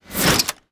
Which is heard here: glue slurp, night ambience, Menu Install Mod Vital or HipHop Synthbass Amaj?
Menu Install Mod Vital